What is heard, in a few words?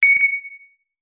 multimedia alert notification basic bell synth positive chime ring